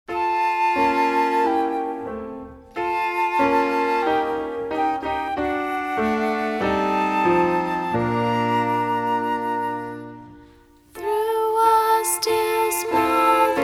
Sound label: words and music